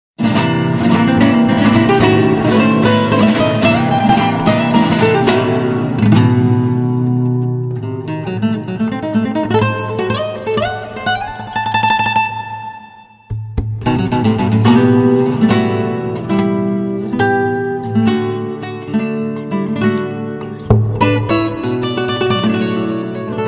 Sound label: Gut Guitar